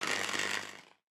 robot repair; Factorio

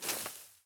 bush small, walking, plant; Factorio

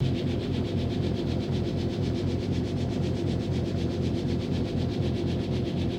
electric furnace; Factorio

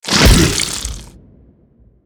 spitter death behemoth, creatures

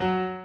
piano, programmable speaker

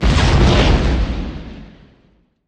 medium explosion; fight

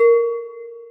celesta, programmable speaker

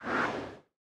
throw projectile; fight

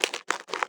biter walk, creatures

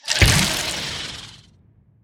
spitter death big, creatures

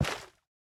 landfill medium; Factorio

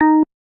bass, programmable speaker

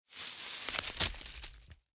tree leaves; particles

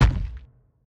build blueprint large; Factorio

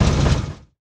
train engine stop; Factorio

